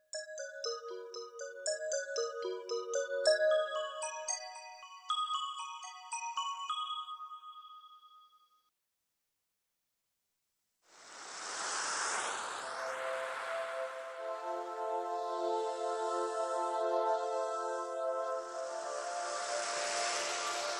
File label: ocean